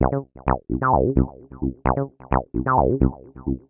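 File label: tb303 acidline, 130 bpm; SYNTH AND ELECTRONIC LOOPS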